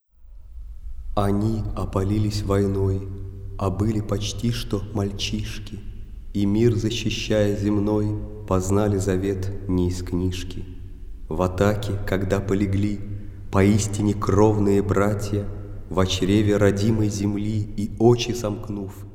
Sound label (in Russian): Хор Валаамского монастыря